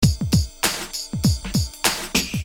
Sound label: 99 Submarine Noise